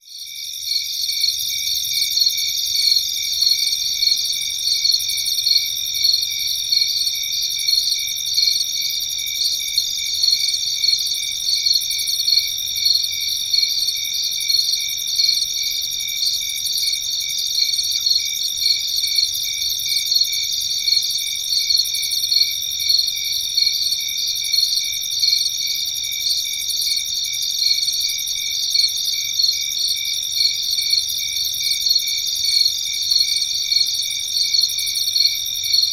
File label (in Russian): strekot neutomimih sverchkov